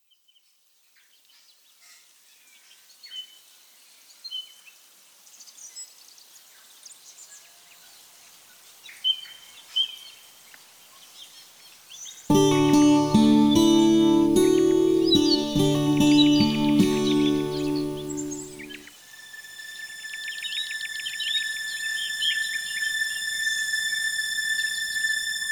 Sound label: music ambiance melody keyboards